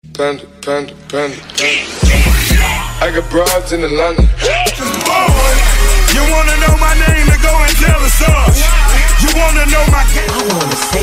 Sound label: hiphop